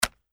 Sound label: football shoulderpads hit